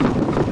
Auto Tire Wood Bridge Loop; Automobiles

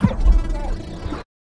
Punch Left Slow Down superhard; Pitch Black - A Detective's Demise

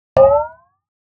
Frying Pan Hit - Hard, Going Up; Hollywood Edge; sound designer's toolkit v2

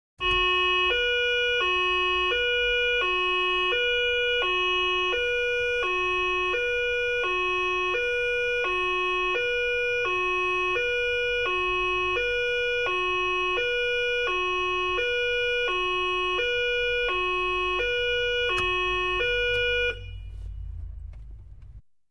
Police Siren Inside Vehicle; Sound Ideas; Series 2000

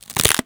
Wood Crack; Crashes; 1001 Sound Effects

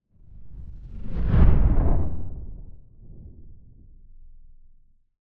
Whoosh Ultra Low Rumble, Drone - Faster; Hollywood Edge; sound designer's toolkit v2

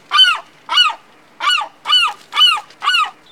Bird - Seagull; RadwinIsland

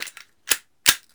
Gun Handling Weapon, Weapons Foley; SFX Kit

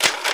Menu Click; Menu FX

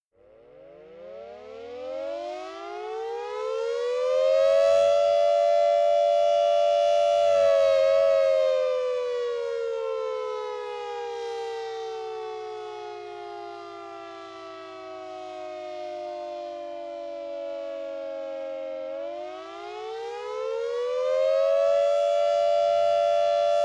WW Alarm; KMP Film Video Sound Effects

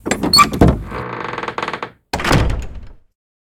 church door; DeadFright

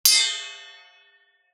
Metal Pan Ping Hit; Hollywood Edge; sound designer's toolkit v2

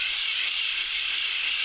Arrow Flying Loop; SFX Kit; Foley - Misc

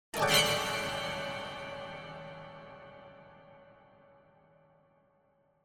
Harmonic Plucks Sharp Horror Chord; Hollywood Edge; sound designer's toolkit v2

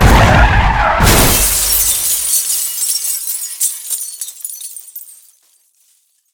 crash by police; Blind Drive

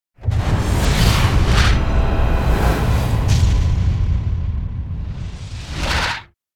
Whoosh Into Multi Fire Impacts Distant Heavy; Hollywood Edge; sound designer's toolkit v2

